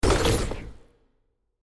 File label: Rock; Qiyana